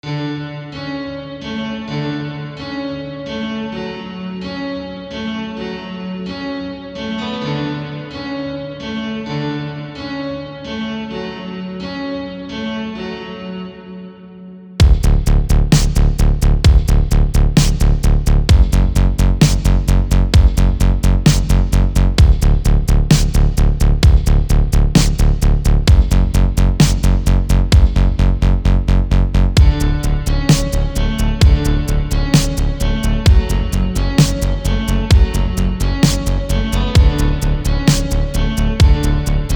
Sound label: RetroWave